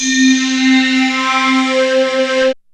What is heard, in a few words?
KORG WAVESTATION; Rubber Chicken Software Sample Bank